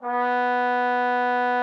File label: TROMBONE, H3; Xsample VOL-3